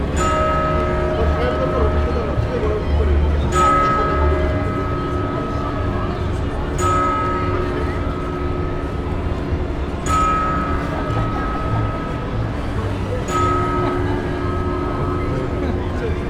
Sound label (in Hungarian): oratorony, szent mark ter, harangozas; olaszorszag; velence